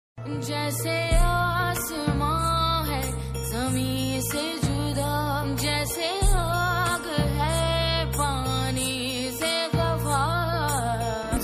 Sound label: Ost ringtone